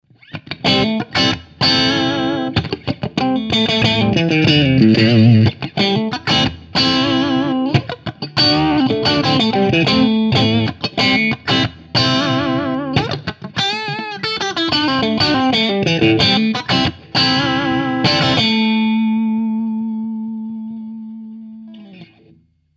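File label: Strat50s middle + bridge JTM45 BB preamp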